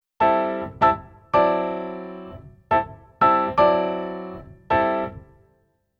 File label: Keyboard Pf Jazz Pf